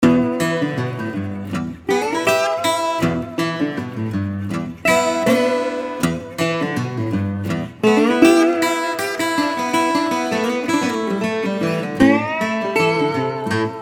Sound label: Blues Folk Songwriter